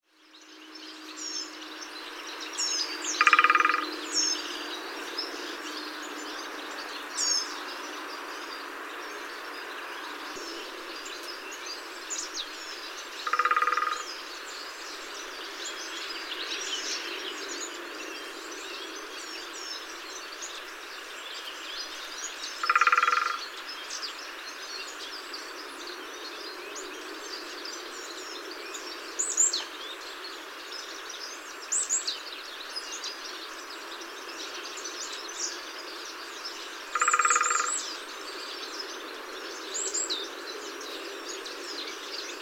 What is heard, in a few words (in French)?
pic epeiche, Pic épeiche; enregistrés par Jacques Prévost de la LPO; chants d'oiseaux